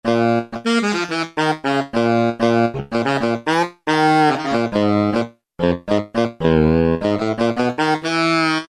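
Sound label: Real Double Bari, E-mu Morpheus; Factory Presets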